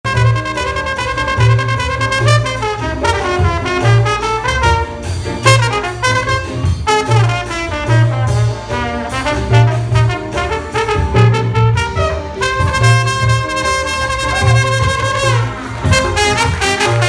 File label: jazz band; jazz centro 2019; la familia; tromboness war